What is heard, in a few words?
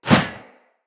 Sphere Sword Whoosh; Weapons; Swords; Sphere Sword And Board